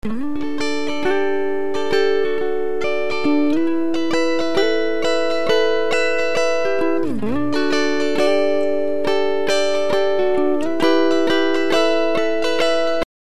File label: Pitch Shift major third, Raised major third; Up major third